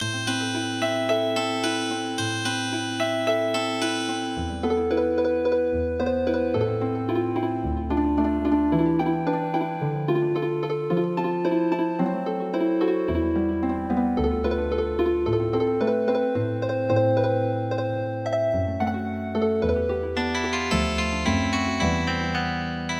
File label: Dodoitsu Healing Harp, midi traditional